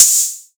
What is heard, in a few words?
Hihat Open Gritty